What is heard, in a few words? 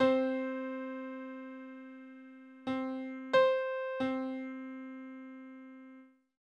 Perfect octave on C